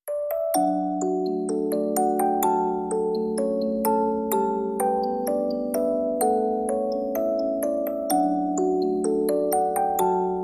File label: Music Box